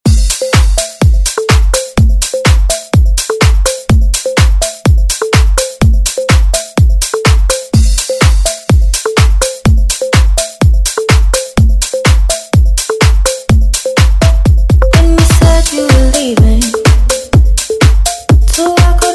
House Redrum Intro

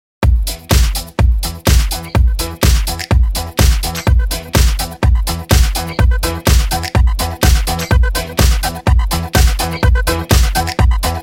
clubmix breaker hype 125 bpm

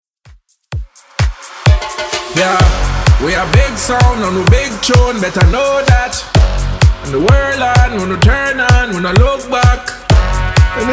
Bootleg Bounce 128 bpm

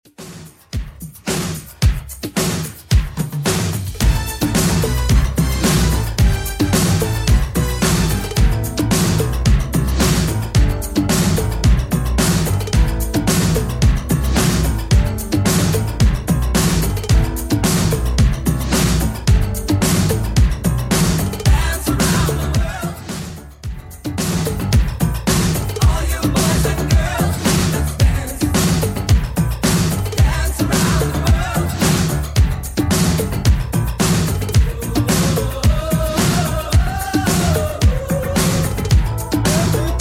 80s Redrum 110bpm

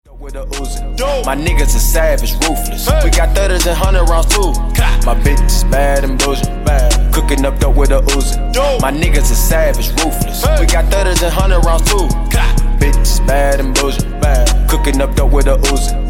Transition 90 Bpm

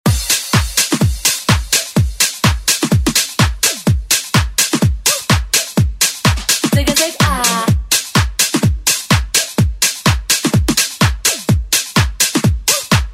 Tech House; Deejay Hit

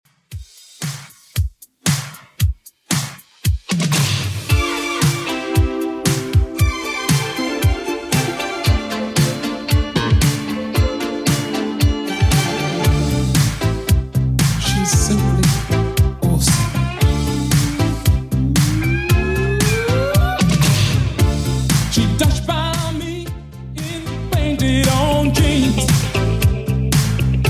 80s Redrum 115bpm

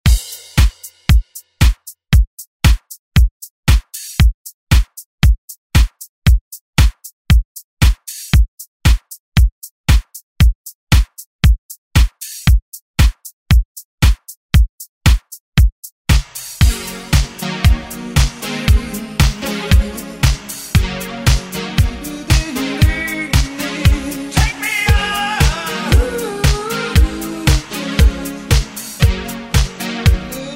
80s Re Drum; DJ Mhark

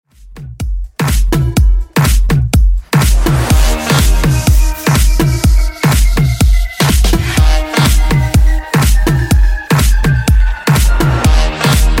MashUp Redrum 124 Bpm

